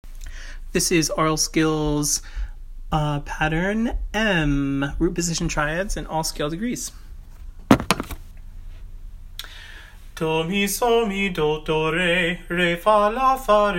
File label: tonal rudiment